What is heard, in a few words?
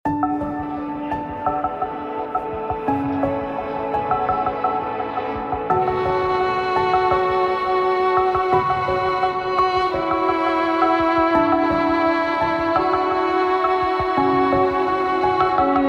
Violin